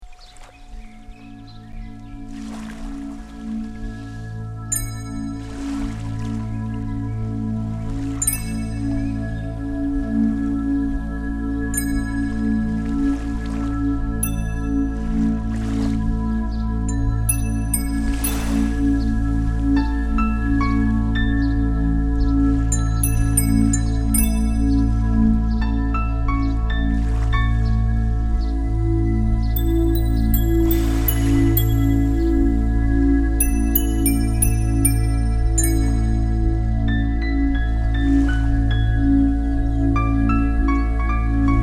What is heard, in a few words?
Miracle Chord, Respiratory Relief Streaming for use with Headphones; vibro-acoustic devices